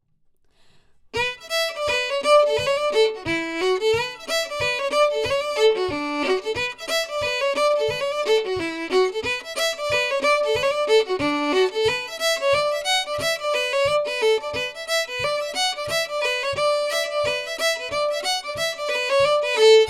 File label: Concertina Reel; John Kellys